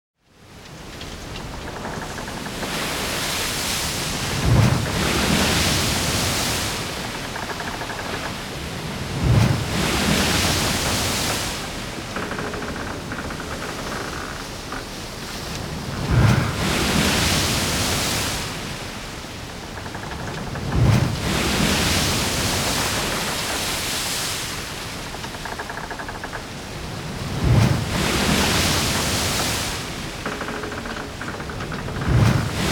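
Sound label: old ship in storm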